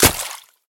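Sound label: quiet splash in the middle of a lake surface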